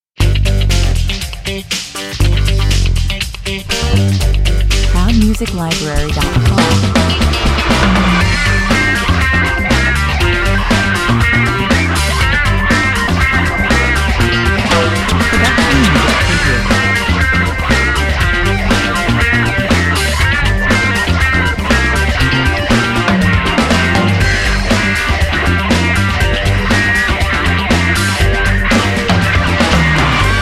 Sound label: american funk